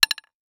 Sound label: Impact Cement Hit By Small Metal Pipe